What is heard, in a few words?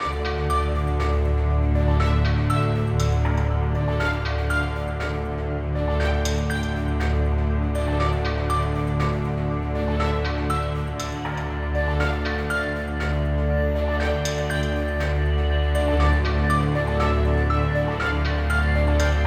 Snow Level loop; Rezrog; Depard; Gems of Rezrog